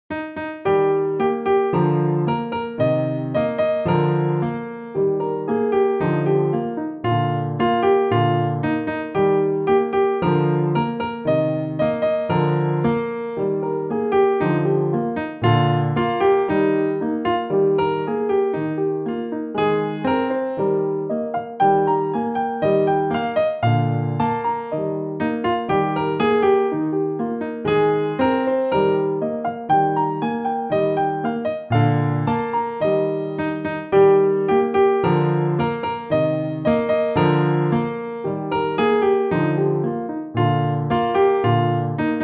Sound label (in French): ecossaise; beethoven ludwig van